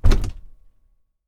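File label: ST DOOR CLOSE; 1000fx; Doors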